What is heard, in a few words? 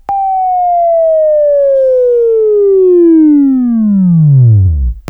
WAV Left, Sweeping; Sweeping Training program